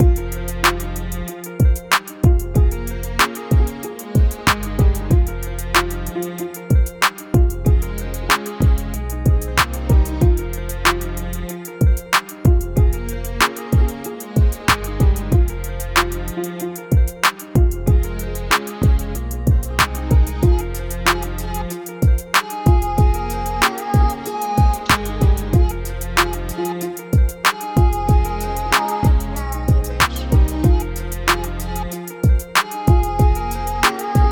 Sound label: F minor 94 bpm